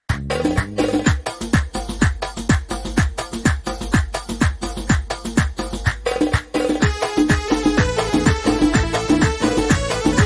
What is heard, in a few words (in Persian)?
Bandari Orkesteri Bikalam